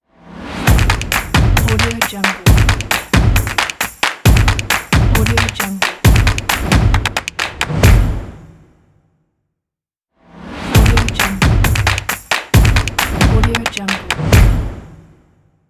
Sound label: stomp clap energetic drums logo